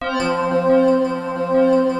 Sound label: CROW CHOIR; East West; Global Sounds; VFX-SD